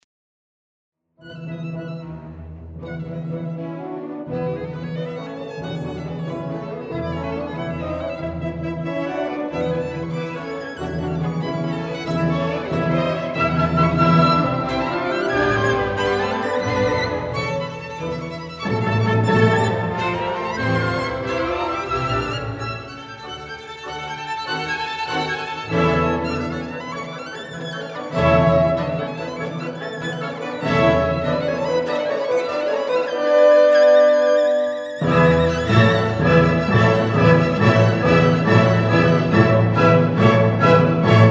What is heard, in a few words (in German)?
Violinkonzert; 3.Satz